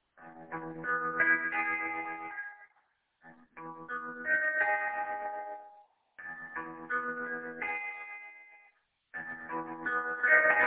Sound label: like a quiet snowy night